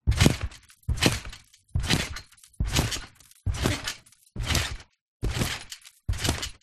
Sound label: trampoline bounces jumps close up underneath